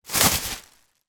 foley bag plastic pick up from floor contains fruit; zapsplat